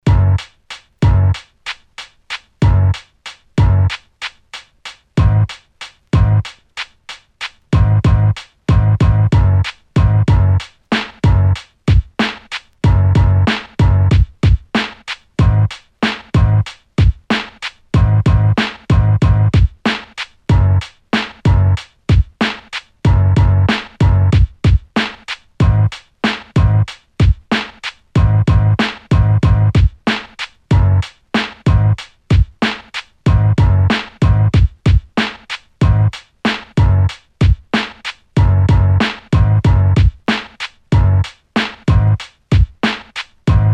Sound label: Belt Drive Breaks, Beat